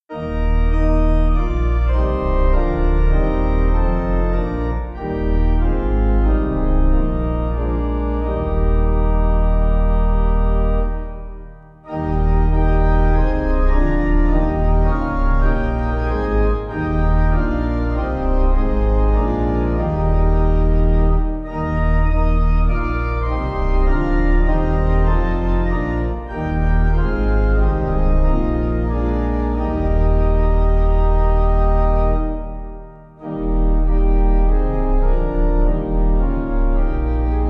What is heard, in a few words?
Pipe